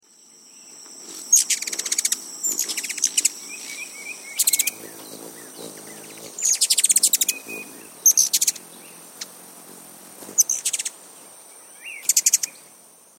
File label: hummer; Female Ruby Throat; May thru October